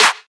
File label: good hand clap sound A key; single hits one shots